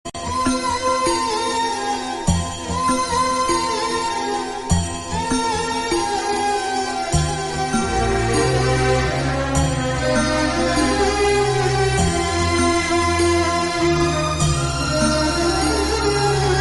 instrumental sad; brahma vishnu shiva

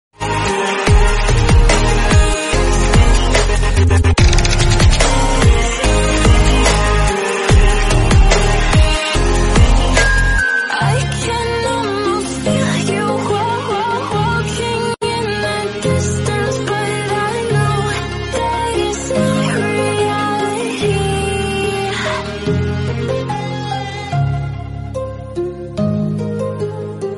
english bgm

